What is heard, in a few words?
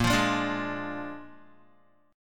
A sharp 7th A sharp, Guitar; A#7; strummed; 5,8,5,7,8,x